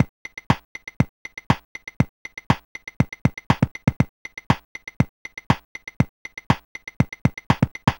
Apple II Elektro Beat, Drums, Chiptune; Sonic Foundry (Sony Creative Software)

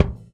Rolling, Kicks; Airwindows

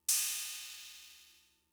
Cymbal, Fricke MFB-522, Drum Machines

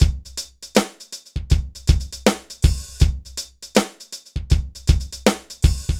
dry Loop BPM 90 Dry, AKAI S6000

